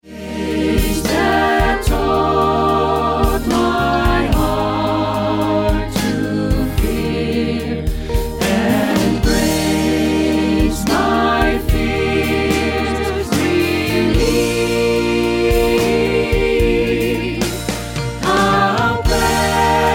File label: Amazing Grace Gospel Choir